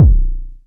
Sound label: BD, Waldorf Blofeld